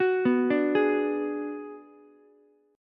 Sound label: G sharp Minor 7th Flat 5th G sharp down Ukulele; G#m7b5 arpeggiated; JGuitar